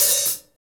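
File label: hi hat; HH-Kits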